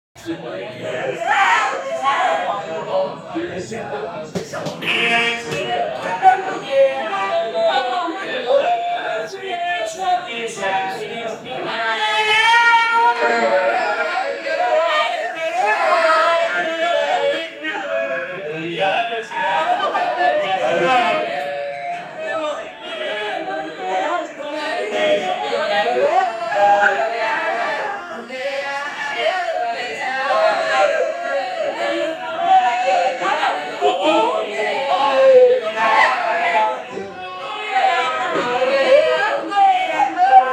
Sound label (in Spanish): necesito un sonido ambien, party